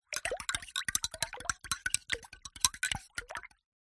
spoon stir hot water tea or coffee in mug; food drink; zapsplat

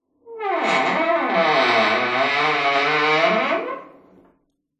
horror door heavy metal dungeon creak squeak

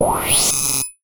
StunGun; martyn stonehouse

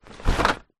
household under bed storage box fabric movement

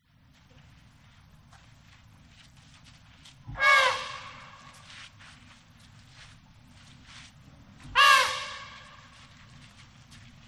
Elephant; audio hero

